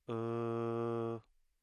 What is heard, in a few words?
mid-high central unrounded vowel